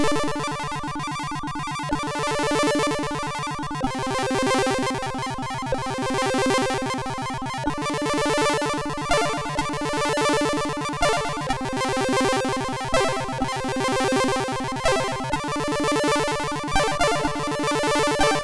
Intro Jam, MOS6581R2

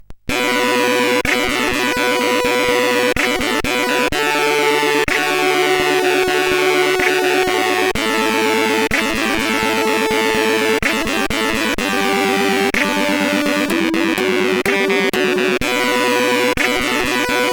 Verde note, CSG8580R5

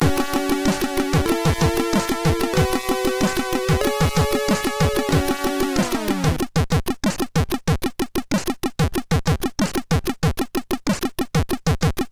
Digital Zakx tune A, sid MOS6581R3

